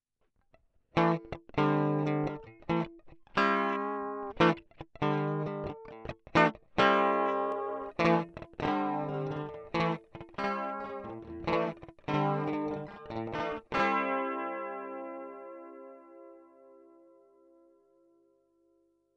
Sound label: CD Chorus Stereo